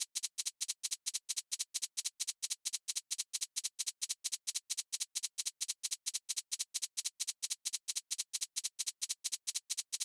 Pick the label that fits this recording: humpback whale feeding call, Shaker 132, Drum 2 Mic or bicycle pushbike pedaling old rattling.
Shaker 132